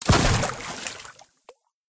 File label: water land; Golden Crayon Sounds